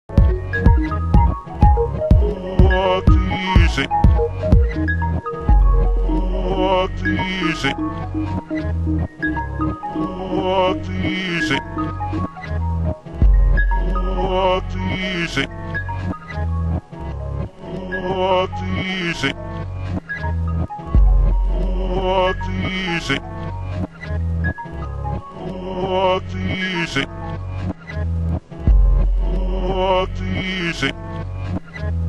music

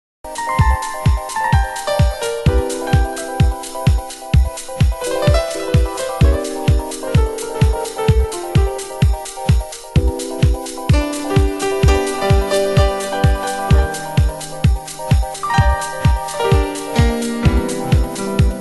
loop

